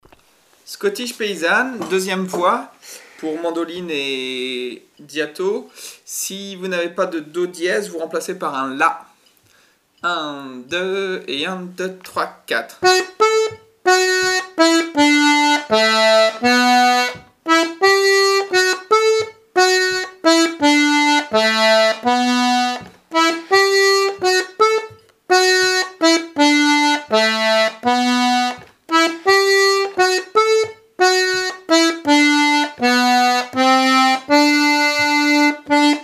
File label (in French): scottish paysanne 2e voix; L'atelier de la casserole